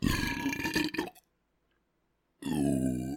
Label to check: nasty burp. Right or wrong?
Right